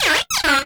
DUCK, Anime Sound FX; COMIC

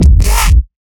Growl Bass; neuro; BIG5AUDIO

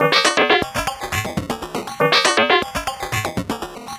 Random, LFO & Noise; Sonic Foundry (Sony Creative Software); Prototechno

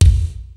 PHAT TRAX KIT, DRUMS; Kid Nepro; Elite

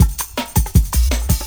Huh Hup 162, breaks

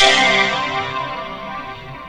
VOCODER DRUM

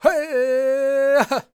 powwow, World Voices, Lakota; Spectrasonics Vocal Planet CD5

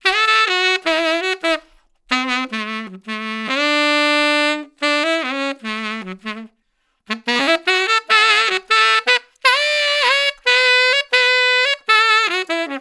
Tenor One Shot, tenor sax melody; Sonic Foundry; Jazz Solos and Sections; Traditional

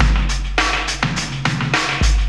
BEAT, Hip Hop, 102-105bpm; E-MU Formula 4000 Series Vol. 1; Hip Hop Nation

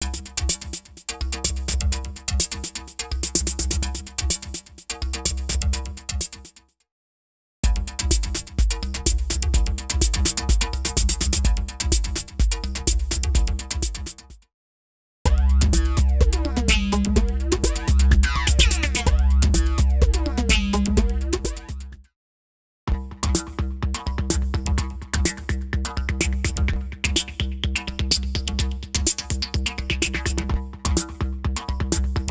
Electronico 126bpm; X-Static Goldmine 5

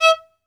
Synclavier Strings, SOLO VI; ILIO

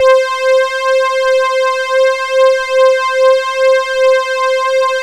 poly synth; Sound Gallery - Charged; POLY SYNTH 1

